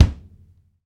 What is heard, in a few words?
kick, natural kick; Roland L-CDX-01; KIK Natural K1